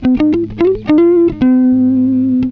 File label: SOLO LICK, Gtr; PlatinumPhattCD2; E-MU Producer Series Vol. 8 – Platinum Phatt